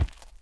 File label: Animal Foot Large Tund; Civ4 warlords